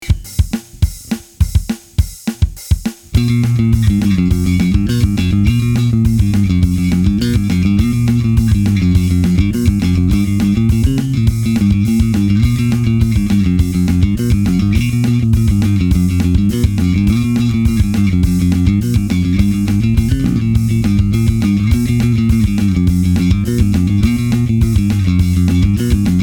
bass with rock beat

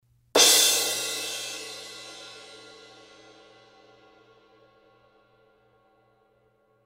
crash

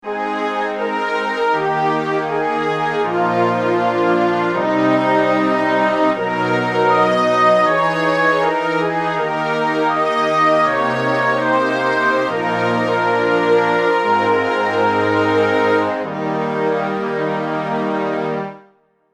wind string brass

